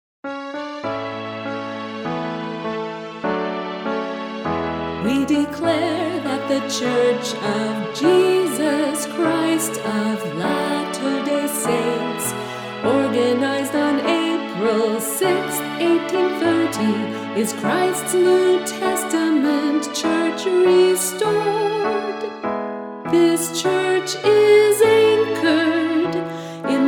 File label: Vocal